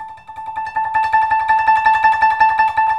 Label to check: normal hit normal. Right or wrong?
Wrong